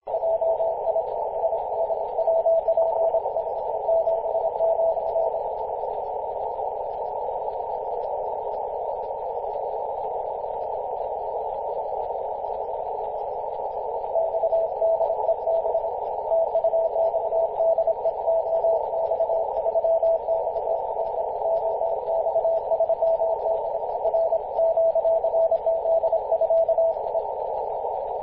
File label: 14.017 MHz CW; ox3xr